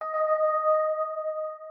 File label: organ